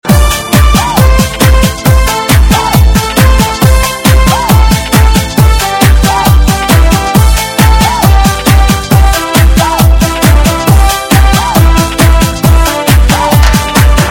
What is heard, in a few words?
Loop